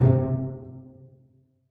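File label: string pizzicato C2 mezzo forte right; Sound On Sound - Peter Siedleczek's Orchestra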